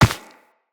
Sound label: Run Dirt, Footsteps; Characters; Operator